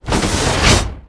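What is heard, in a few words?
door close; Star Wars Obi Wan; XBOX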